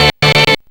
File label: GATE VOX R; Big Beat Samples & More; AKAI; VOX FX1 KIT